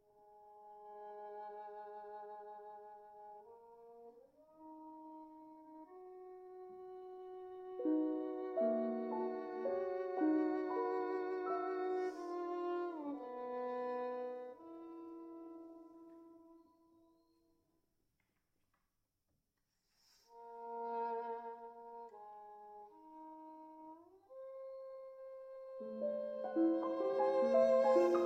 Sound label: violino sonata